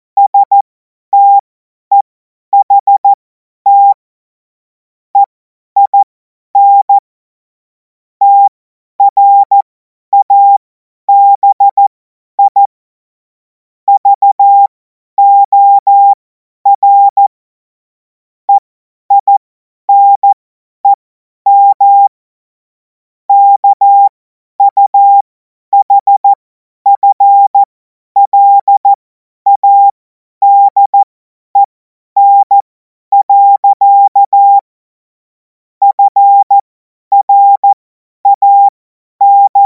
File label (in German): Witz, cw morsen; Morsekurs